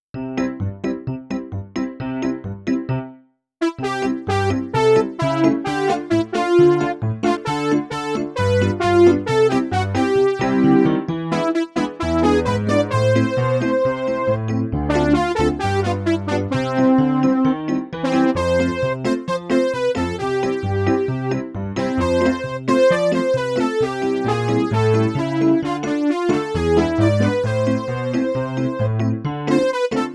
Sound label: karaoke